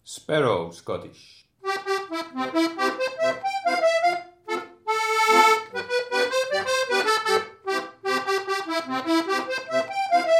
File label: sparrow schottish; schottisch